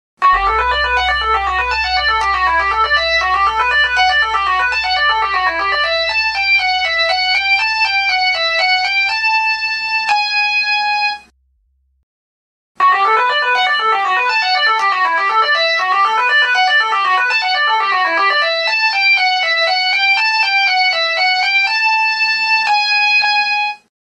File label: EXTREME ALARM RINGTONE